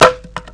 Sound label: drop plank